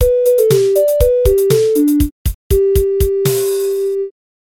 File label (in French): carillon